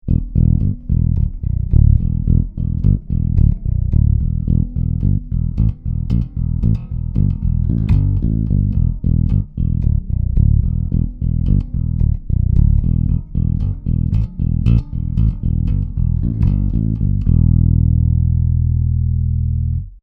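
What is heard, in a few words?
Warwick Streamer Neck Bass Boost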